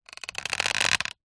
plastic creak stress; zapsplat; foley

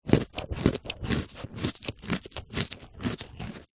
human eat biscuit mouth closed

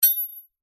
impact metal thin stick hit; zapsplat

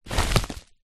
parcel large padded envelope pick up; foley

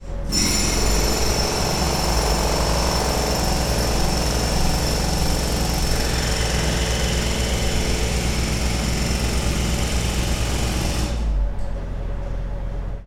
industrial tools jackhammer operating; sound effects one

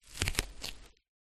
office sticky tape fingers stuck remove; zapsplat

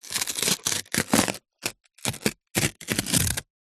cardboard box small glossy skin rip; foley

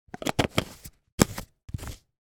flashlight torch small head cap screw on; household; sound effects; sound effects one

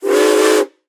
Steam Whistle; Blastwave FX

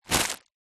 foley plastic bag empty hand grab; zapsplat

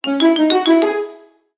cartoon ascend climb sneaky orchestral

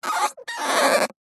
cartoon rubber squeak

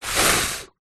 human spit blow water spray

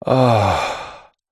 human male exhale ahh pleasure groan; zapsplat

